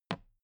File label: toolbox handle bounce soft